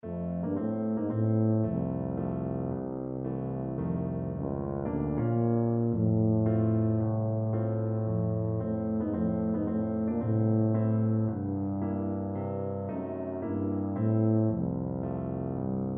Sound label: tuba